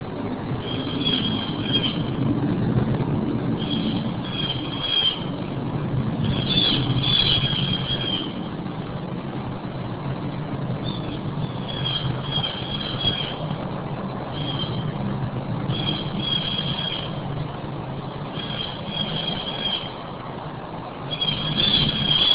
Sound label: bluejays